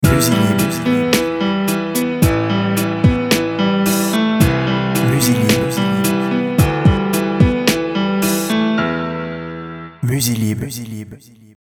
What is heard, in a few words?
hiphop